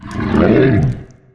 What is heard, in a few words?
pain, dragon